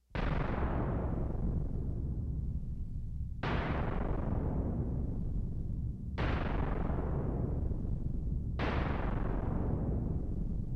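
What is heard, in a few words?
SPACE EXPLOSION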